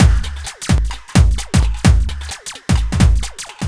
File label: 130 Terradome No Snr, Drum Loops 130bpm; Zero-G; Soundclash Nu Dance Electro; Acidized Wavs